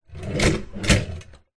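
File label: household bathroom medicine drawer open then close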